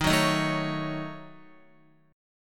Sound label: D sharp Minor 7th Flat 5th, Guitar, D#m7b5 chord; strummed